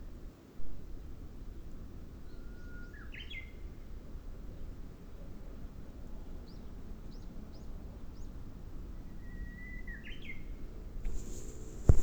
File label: nightingale